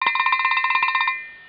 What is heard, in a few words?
COWBELL